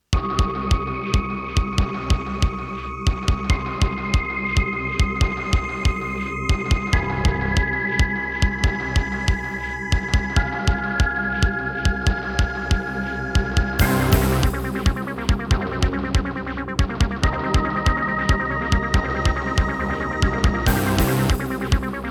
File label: Roland CM-500; sigil doom episode 5